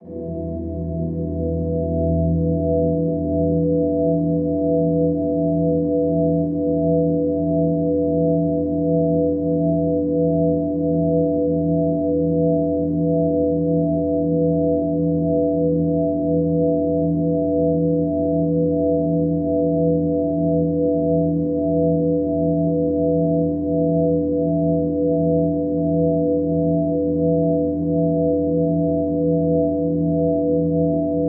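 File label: E minor Massive Harmonic Drone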